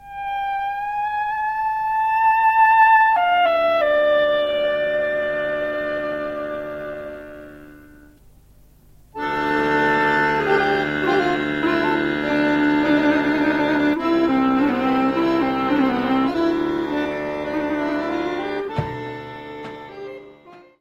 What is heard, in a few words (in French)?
Gajda, Bulgarie